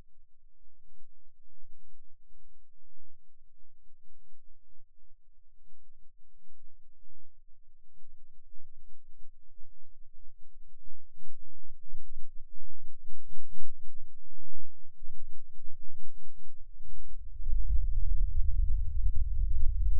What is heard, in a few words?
Rhythm Seizure, eeg